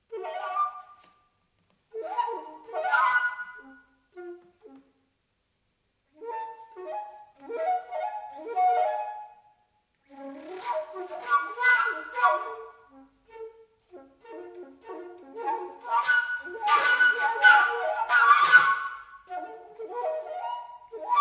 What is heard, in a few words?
Quarrelling duet